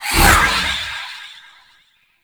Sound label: Wraith Throw; Enemies; Warframe; Zariman